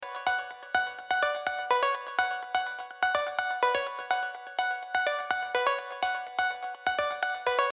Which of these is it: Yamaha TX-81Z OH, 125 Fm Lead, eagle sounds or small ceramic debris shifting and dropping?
125 Fm Lead